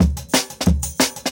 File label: Crunch; 90 s Sample CDs; Original Jungle Breaks